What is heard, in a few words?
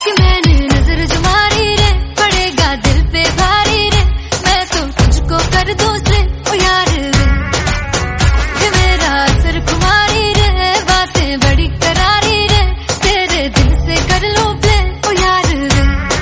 bollywood movie song tone ringtone